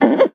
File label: FRET, FINGER MUTE; Xsample VOL-1